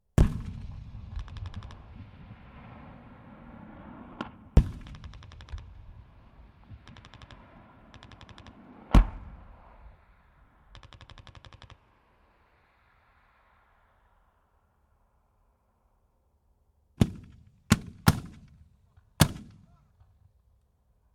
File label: Battlefield atmosphere (Close perspective); Battlefield atmosphere, middle-distant perspective with close mortars firing; Battlefield Sound Effects; BBC